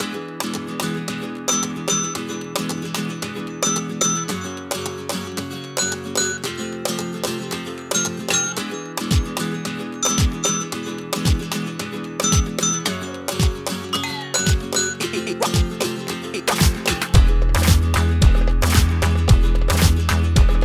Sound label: gypsy ride, latin